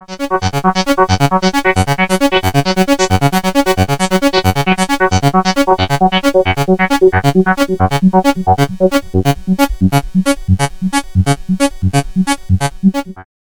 ARP Avatar Patch E VCF via Velocity KCV OSC2 via OSC1